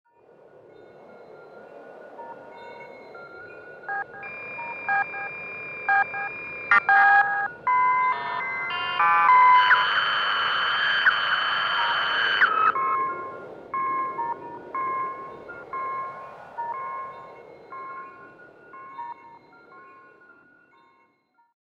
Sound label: sound design example; dwarf fortress dungeon keeper theme hospital kickstarter